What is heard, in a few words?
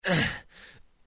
hurt